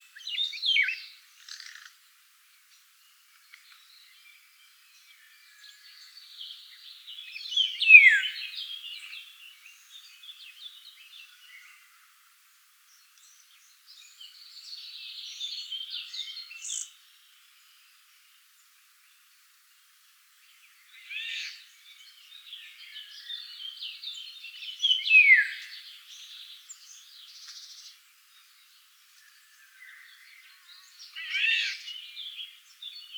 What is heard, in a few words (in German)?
Pirol; Ornithologie